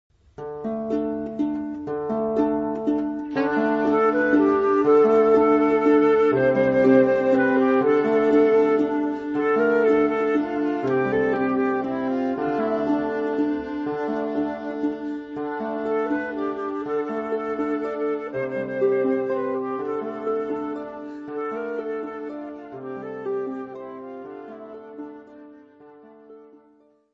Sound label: Medieval Melody